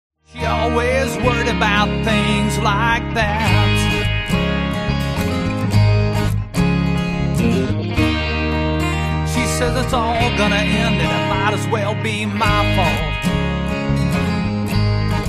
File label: minus drums; play along drums rock pop and blues